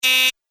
Apartment Buzzer Short Press, doorbell sound effects